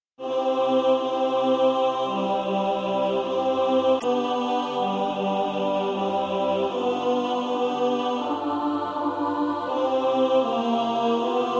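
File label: tenor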